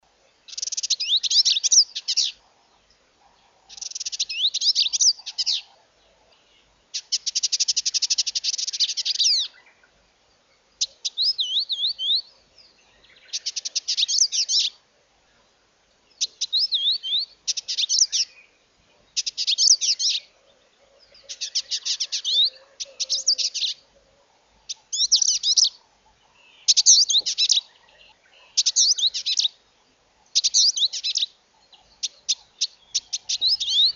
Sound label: village indigo